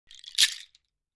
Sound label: Shaker Bracelet Natu, percussion shaker